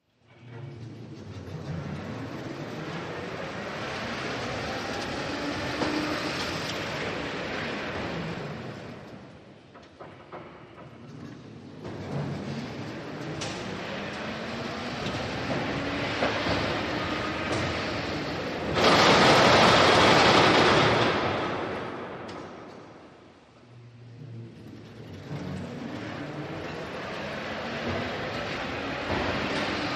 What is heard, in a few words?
Crane Motor Movements, Labored Starts Stops, Cu Perspective, W Grinding Noise.